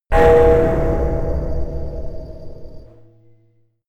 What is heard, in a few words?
bell impact